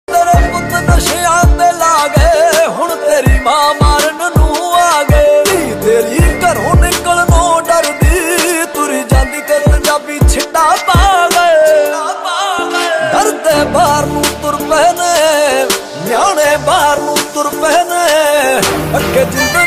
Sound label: Popular Punjabi Ringtone